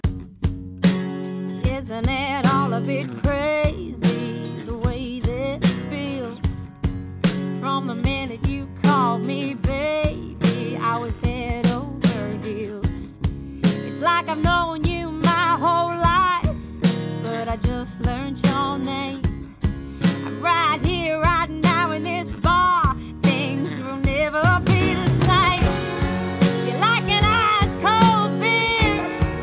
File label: guide vocals